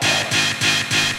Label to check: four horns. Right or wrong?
Right